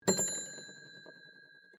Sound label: TELEPHONE WOODEN AUTOMATIC DIALING WALLPHONE BELL DOUBLE; Various old telephones